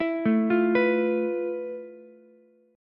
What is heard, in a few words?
F Major Sharp 11th, Ukulele; arpeggiated; JGuitar